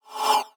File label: Messagebox In